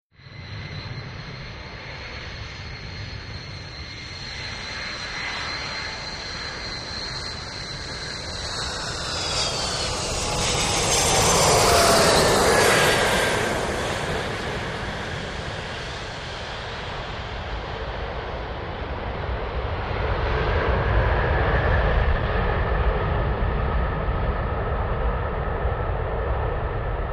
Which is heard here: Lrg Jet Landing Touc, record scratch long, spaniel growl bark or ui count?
Lrg Jet Landing Touc